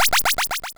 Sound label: power up